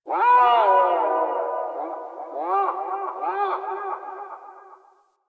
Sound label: Landscape Distant Birds, Ambience; Warframe; Venus Open World; One Offs